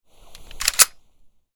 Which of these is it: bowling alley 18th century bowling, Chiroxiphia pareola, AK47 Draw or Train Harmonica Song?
AK47 Draw